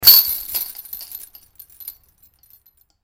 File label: disc golf basket